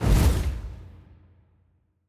kaiju superweapon fire burst; Stellaris; biogenesis; ships

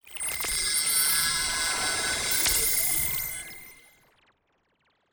alien holoprojection; rifts; Stellaris; astral planes

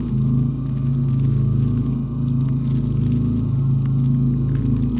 ship weaver idle; Stellaris; biogenesis; ships

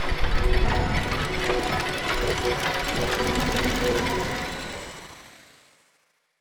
time crystal; rifts; Stellaris; astral planes

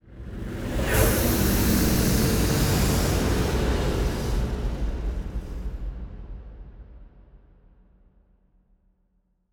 ship juggernaut move; Stellaris; toxoids; ships

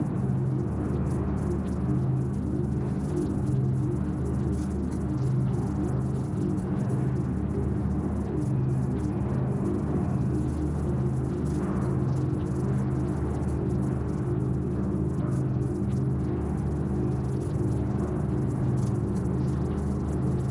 neuron calculator phase 1 idle; Stellaris; machineage; superstructures

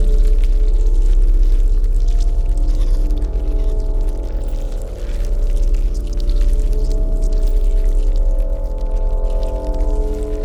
ant ship science station idle; Stellaris; biogenesis

